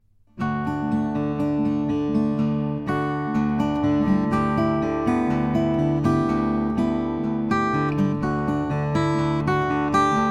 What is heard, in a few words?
santa cruz fs cutaway indian rosewood